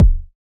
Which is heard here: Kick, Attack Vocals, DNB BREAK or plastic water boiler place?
Kick